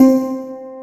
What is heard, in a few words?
WATER HARP, JV-1080; Syntec; Wall of Sounds VOL-2; ORCHESTR-EXP